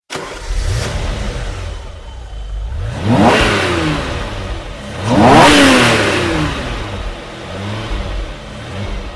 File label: Engine